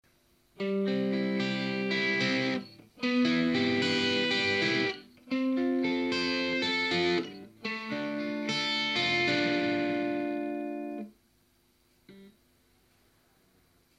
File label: Arpeggio